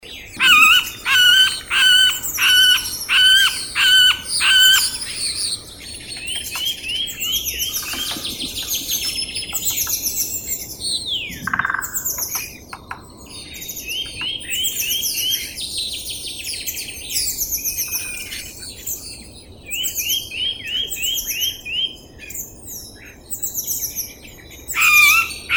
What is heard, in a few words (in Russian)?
dyatel srednii pestrii dendrocopos medius